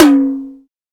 TOM, Jungle; Gorge Sample Pack Vol.1; San Gabriel

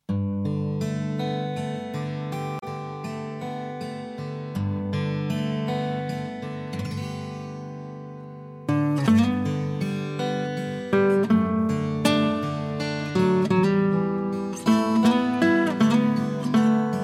Pure guitar

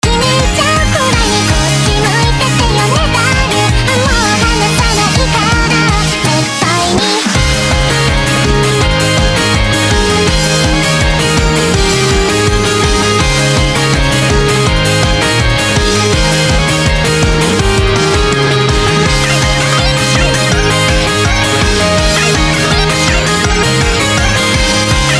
guitar

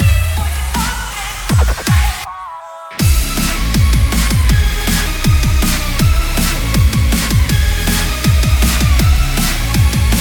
Instrumental

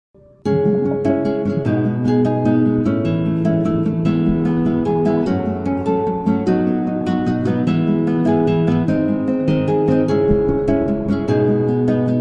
Acoustic Guitar Cover

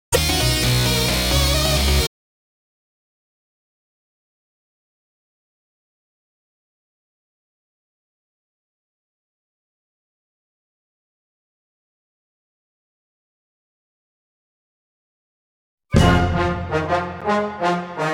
Orchestral

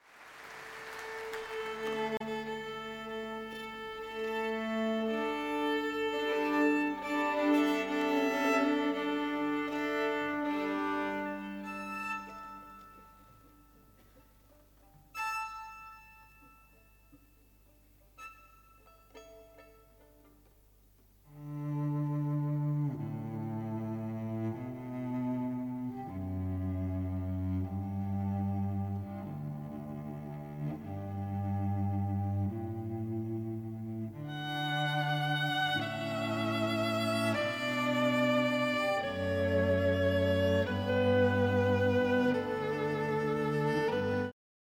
Philharmonic Orchestra Canon & Gigue

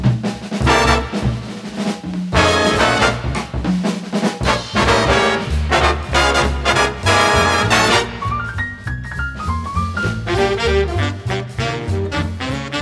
Gentle Forest Jazz

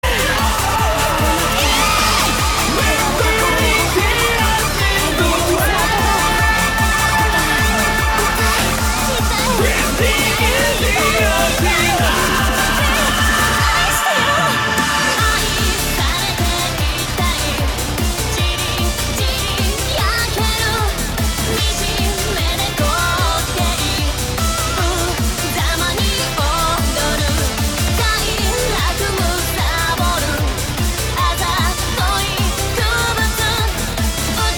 Cleaner Acapella

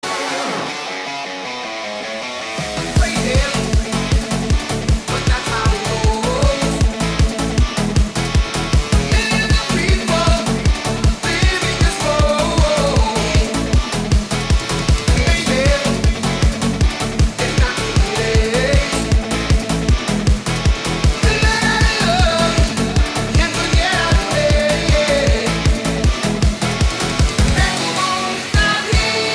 Eurobeat Remix

